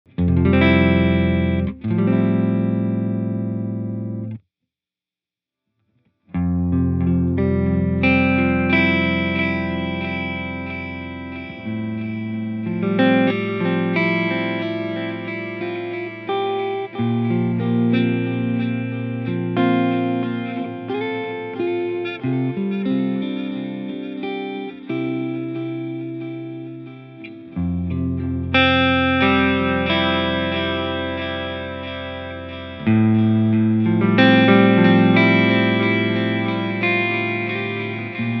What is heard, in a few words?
Mod Panning Delay